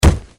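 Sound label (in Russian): попадание пули в игрока; Звук урона в игре, пуля попала в игрока; cs go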